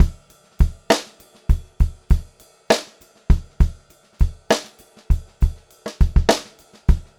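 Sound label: variation, pop-rock drumloops; USB Soundscan vol.37; AKAI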